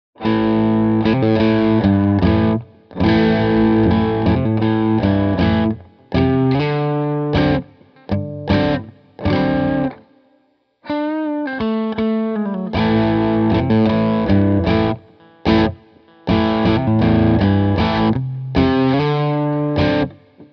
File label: Bridge Full Dirt, pickups